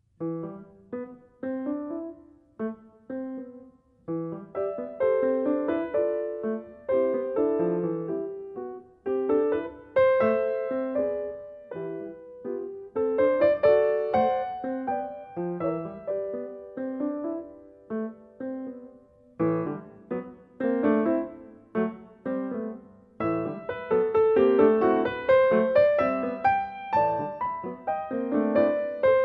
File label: Rumba, chamber